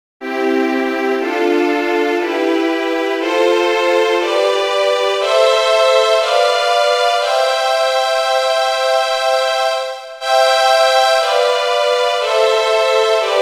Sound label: Maj Diatonic Chords